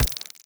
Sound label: step layer, headcrab black movement